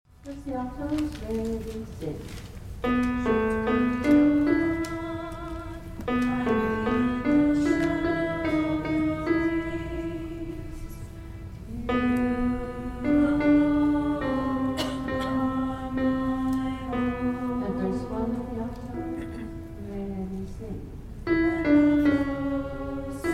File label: Shelter Me O God alto and bass